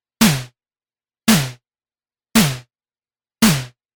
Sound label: Snare Ondes VCO Sys 500 Filter